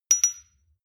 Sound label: shot glass clink bounce medium; soundpool